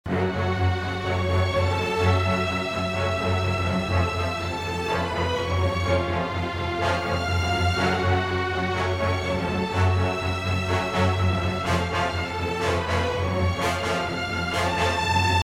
harmony brass end all, harmony in staccato (and ostinato line in low strings); epic orchestral track